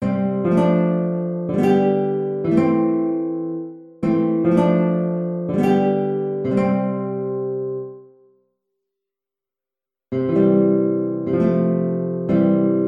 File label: guitar